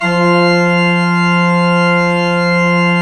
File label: ORG, CHURCH ORGAN; Roland - LA Composer vol. 1